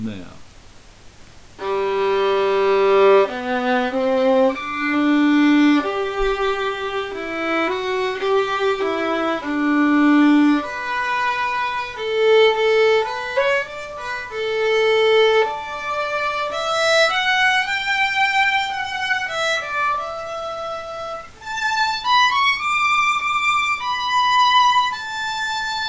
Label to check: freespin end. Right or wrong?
Wrong